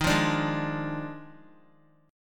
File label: Eb Major 7th Suspended 2nd Suspended 4th, Guitar; strummed; chord; JGuitar